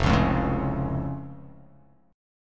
F Augmented F

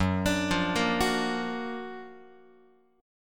F sharp Augmented Major 7th F sharp Guitar; arpeggiated; 4A,4E,5A,5D,5G#,6C#; JGuitar

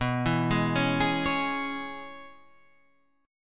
Db Minor B; arpeggiated; Dbm/B; JGuitar

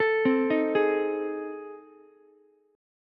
A Minor Major 7th Flat 5th A, Ukulele; arpeggiated; AmM7b5; JGuitar

